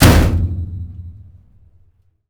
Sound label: barrel hit med; Doom